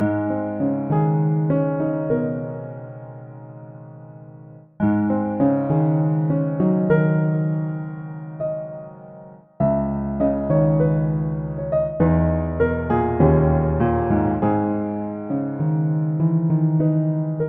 Piano Piece; Winter Lights

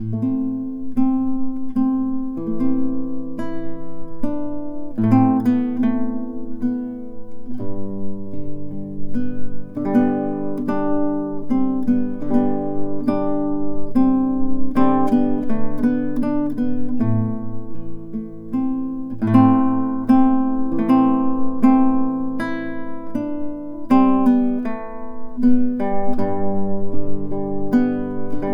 Guitar

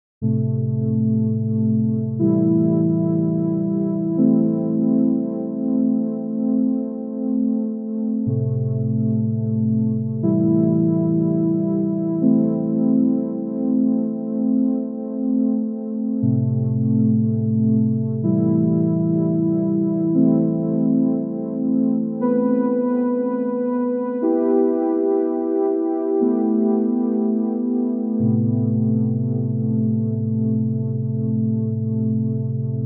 Am 120 synth chords; Cold Circle

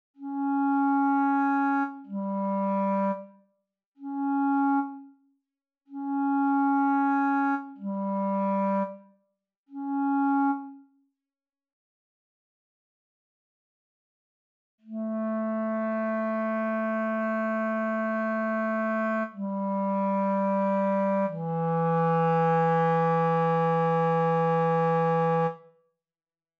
Clarinet

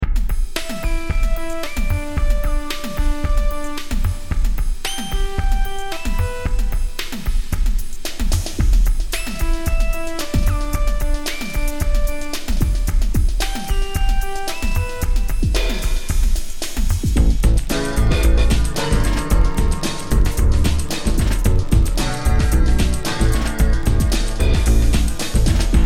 A112 16 Bar Groove

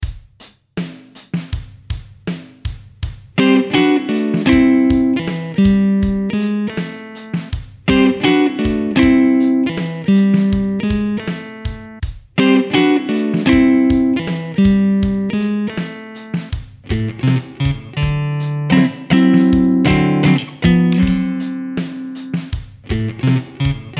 Blues Riff A 80 BPM (Nylon)

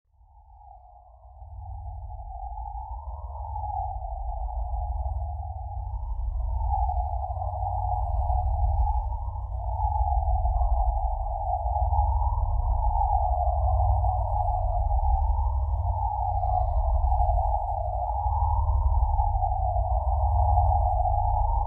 Alien Machine Activate

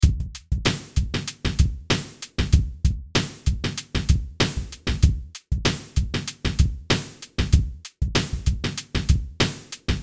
beat

